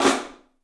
PICSNF, 3D Drums, PICOLO FLAM; 3D Sound

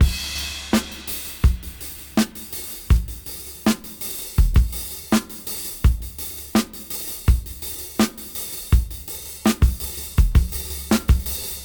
Gresy 82 BPM; AMG - Keith LeBlanc Phat Butt Jams

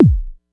Kick Small, Cwejman S1

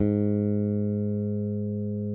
SPEC SUSTAIN, Bass

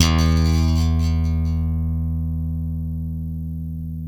FUNK F, Bass; Spectrasonics; Bass Legends

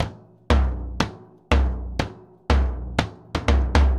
BOMBO, DRUMS SAMBA; Best Service ProSamples vol.14; AKAI

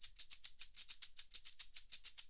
STABC 104, NYC Percussionworks, LOOPS; Sampleheads

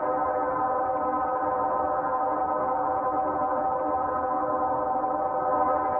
Tonal Re Draw, sparse soundscape

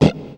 stroke, guitar

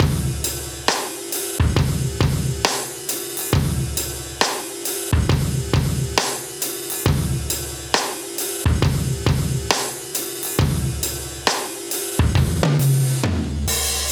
68 live breaks

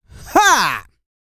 GRUNT, MALE HUMAN; Spectrasonics Vocal Planet

